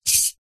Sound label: household aerosol deodorant can handling; zapsplat